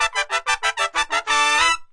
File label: horn stabs 3rds harmonized; HORNS SAX TRUMPET BRASS